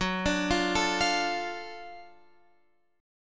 Gb Minor Major 7th Sharp 5th Gb, down, Guitar, Open F; arpeggiated; JGuitar